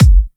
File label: Kicks; Vengeance Samples